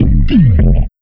Bumpers; Sickly Fx; Sonic Foundry (Sony Creative Software); Esoterik Beatz

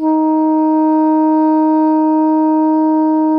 SOPRANO D#3, Saxophones; PP; Greytsounds; Philip Wolfe David Crozier's Saxophones

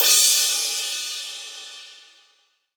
Crash, Drums Cymbal

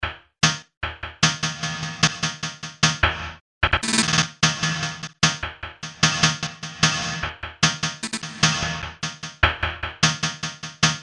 Skank, Chords; Industrial

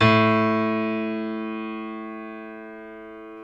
PNO XYPNO, Grand Pno, Roland; Club-50 - Foundations; Grand Pno 2

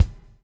BUBL; Spectrasonics - Backbeat

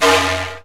SIKU F#1; World Combo

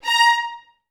A#4, Advanced Orchestra; Best Service

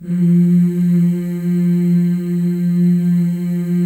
FEM, Symphony of Voices; Spectrasonics

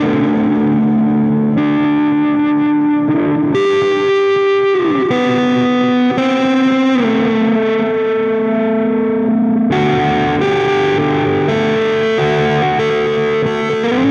Guitar, Indie Rock Anthems; Sonic Foundry (Sony Creative Software); Session 04 - Dubwyzz

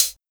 HiHat Closed, Fricke MFB 512

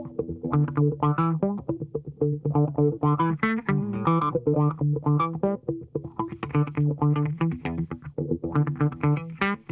BACK 2 BACK, Funk Guitar; 90 s Sample CDs; Zero G

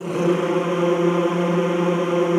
F2, Extended Classical Choir; Best Service

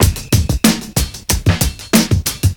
DRM; AMG Gota Yashiki Groove Activator; GOTA GROOVES

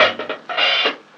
Fill, Drums, Eclectic Electronica

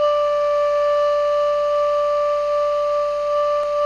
TENOR PP D5, Saxophones; Greytsounds; Philip Wolfe David Crozier's Saxophones; TENOR SWITCH

